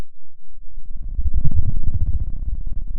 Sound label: Sound of a dinosaur